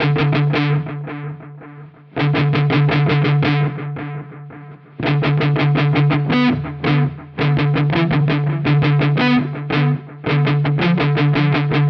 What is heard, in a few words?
Mooer GE300 Lite Mesa Boogie Amp Delay